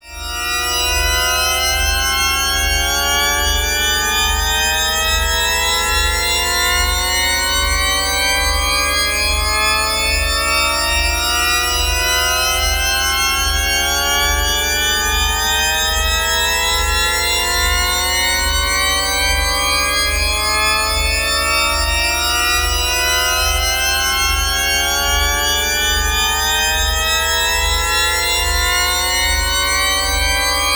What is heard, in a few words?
huge reverb audio